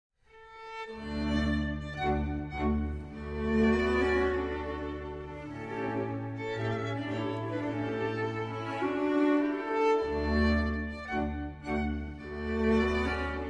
Piano Quintet in A Major

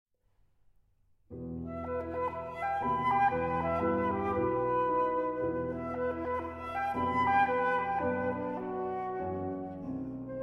Sonata For Flute and Piano, Allegro; Undine Op. 167

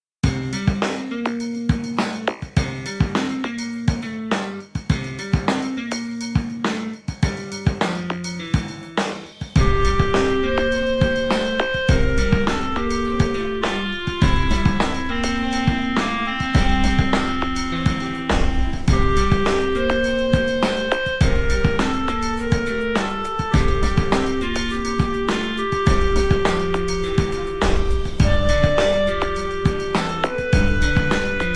Steampunk Music Instrumental

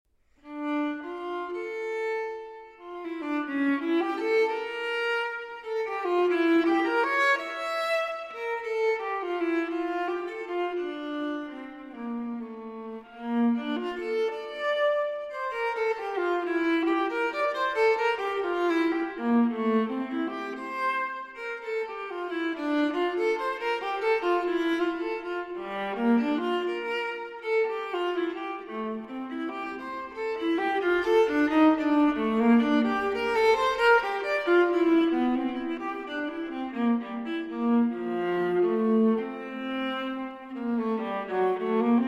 Cello Suite No. 2 in D Minor, for Viola, Prelude; J.S. Bach Cello Suite No. 2 in D Minor, BWV 1008 (Transcr. for Viola) I. Prelude

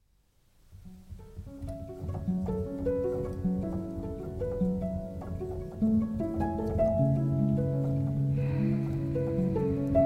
Piano Reworks

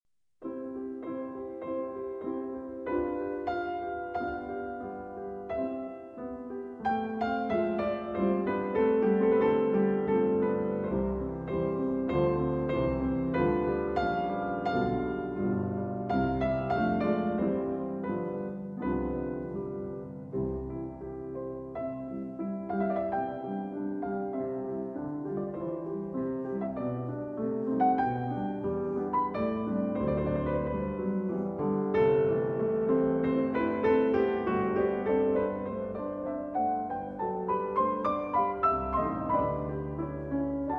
Piano Sonata in G Major, 2 Pianos; No. 5; Arr. For 2 Pianos By Edvard Grieg

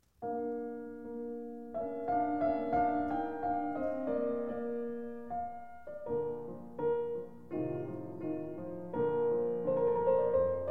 Piano Concerto, Romance; No. 20 in D Minor, K. 466 II.

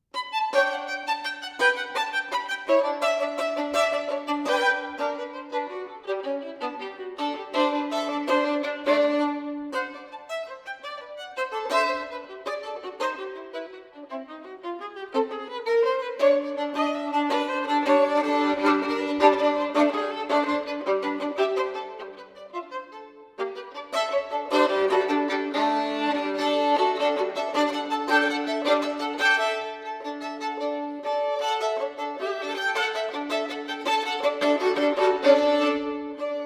Fiddle Dance Suite, Jig; 3. Jones' Jig

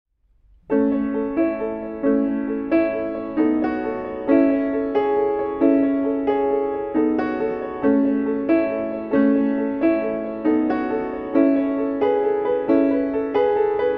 Acoustic

